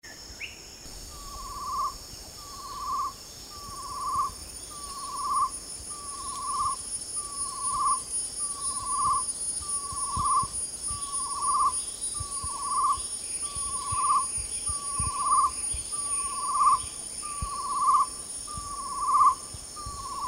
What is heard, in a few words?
chestnut-necklaced hill partridge; DANUM